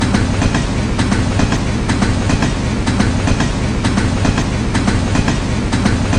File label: train passing sound